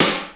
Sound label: snare drum; Crypton - DrumBase5000; 52 SNARES